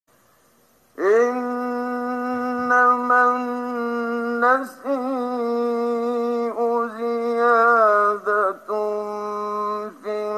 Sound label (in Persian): تلاوت آیه سوره توبه; آیه 37; استاد محمدصدیق منشاوی